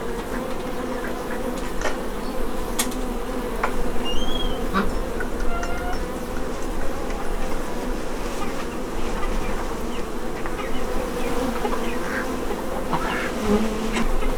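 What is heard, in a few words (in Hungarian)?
rajzás nyikorgó ajtó légy, mezei méh; parasztudvar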